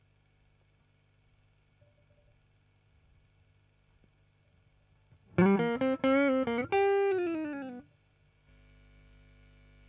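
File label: Guitar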